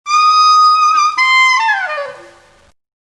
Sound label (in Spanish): Saxofón; Abrapalabra La Magia de Aprender a Leer